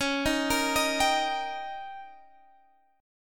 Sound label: D sharp Major; D#/C#; arpeggiated; x,16,13,15,16,15; chord shape